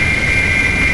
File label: Rocket Missile Loop; Weapons